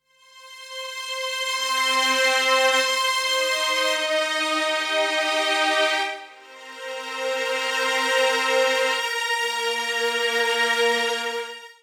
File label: string, Drum+Bass, 160, C; eJay